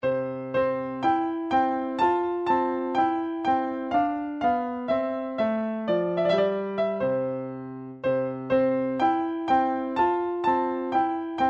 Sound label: twinkle, midi; song book